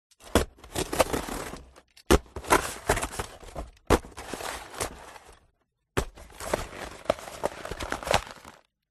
cutting a pile of thin cardboard sheets with a sharp craft knife; Sound Ex Machina